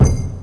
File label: hit, percussion hit; Ueberschall; Techno Trance Essentials; DRUMS